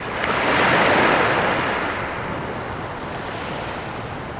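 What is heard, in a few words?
sea roar; The Wild Thornberrys Movie; tengermorajlas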